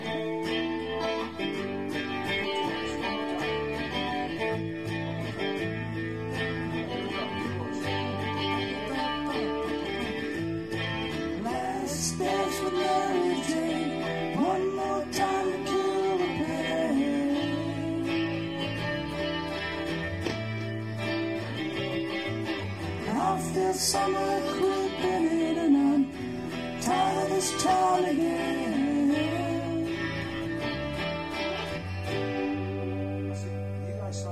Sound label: chorus too close to mic